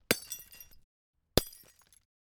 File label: Glass Beer Bottle Breaking With Rock